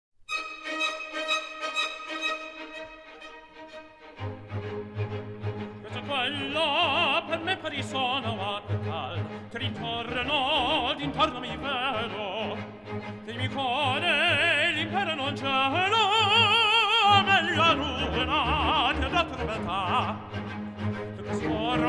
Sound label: Symphony Orchestra